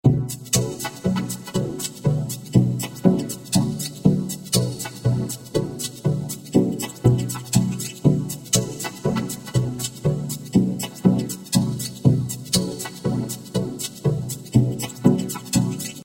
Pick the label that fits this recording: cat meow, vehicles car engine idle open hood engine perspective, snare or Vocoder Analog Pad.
Vocoder Analog Pad